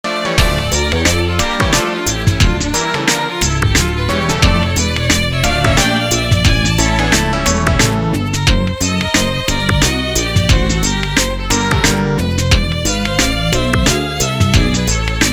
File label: double violin concerto 3rd movement